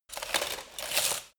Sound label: light walk single